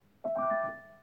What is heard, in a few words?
Electric Jazz Piano